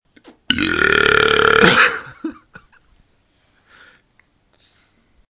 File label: burp